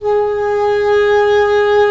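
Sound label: wind cor anglais; LA Composer vol. 3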